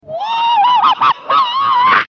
yell

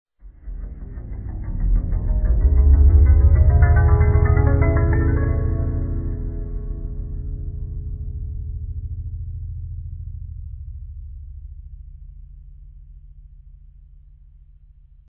harp; vapesquared

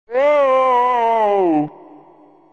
toad despair

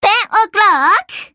clock

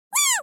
yay

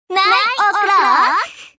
clock

